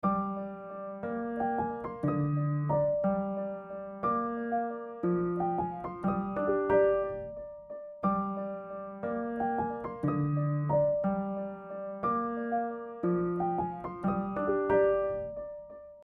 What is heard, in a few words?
calm background piano